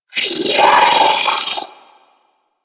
Sentient Vocal Attack; Warframe; Sentients; Battalyst

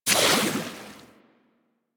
Water Sprayer Beam Stop; Warframe

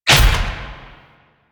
Melee Slam Impact; Warframe; Necramech

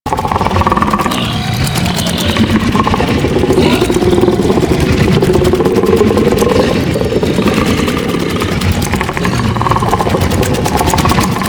Octopede Move Loop; Warframe; Man In The Wall

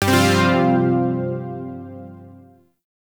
Shawzin Pent Min Chord G; Chords; Warframe; Zariman

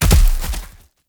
Rock Trap Rock Fall; Objects; Ode Lantern Crate

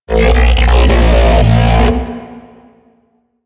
Mech Ambient Vocal; Warframe; Vehicles; NechroMech

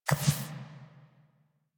Go Board Unplayable Point; Warframe; Sacrifice quest

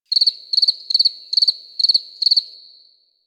Tree Cricket; Warframe; Ambience; Nineteen Ninety Nine

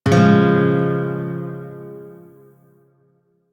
Shawzin Phrygian Chord B, Music; Warframe

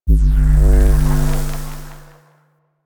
Alt Pulse, Spear Gun; BE Ferrox

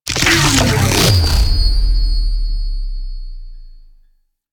Portal Open; Enemies; Sentient Dolicholyst

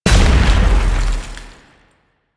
Biped Stomp; Warframe; Man In The Wall

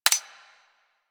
Tommy Gun Mech; Weapons; Warframe; Tenno

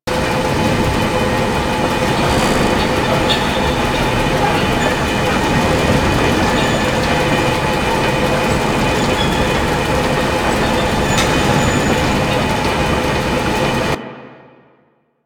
Tram Loop; Warframe; Ambience; Grineer; Grn Tram Connector Curved

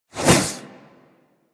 Warfan Whoosh; InfWarfan; Warframe; Weapons; Melee

